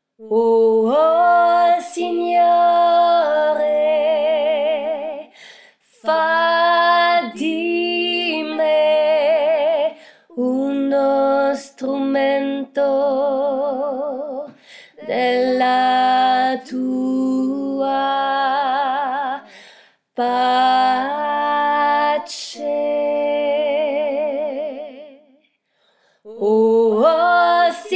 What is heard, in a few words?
O Signore 2eme voix